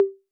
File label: Conga Hi B 808; Clean; Digital